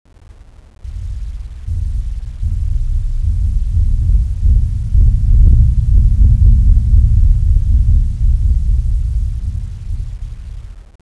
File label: atmosphere; svencoop; trempler